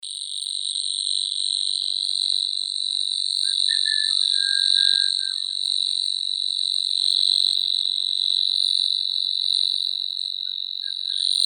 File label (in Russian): zvuki prirodi sverchok solovinii locustella luscinioides